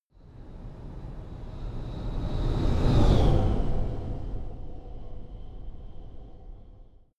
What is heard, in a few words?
sci-fi vehicle train passing long; raumschiff